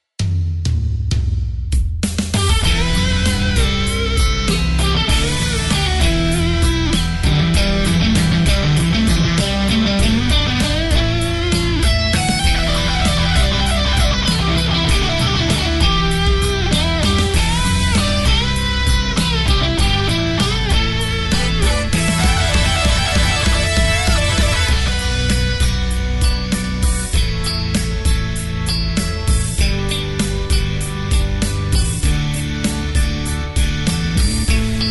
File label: Solo Guitar